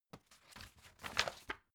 folder page turning high

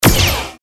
future gun shot

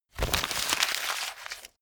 sheet crumple fast

